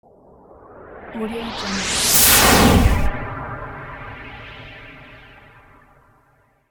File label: abstract noise woosh